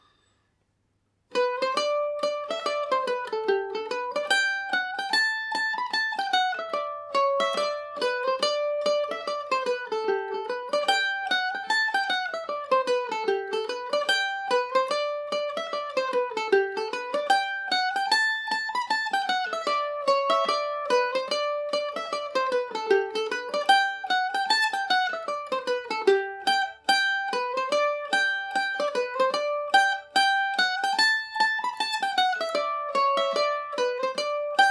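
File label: G major, irish mandolin; The Perils Of Wisdom; G&O #34 mandolin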